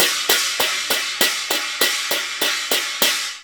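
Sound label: CHINA, China cymbal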